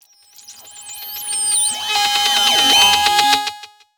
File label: REVERSED GLITCH SWELL BUILDUP; Glitchy Circuits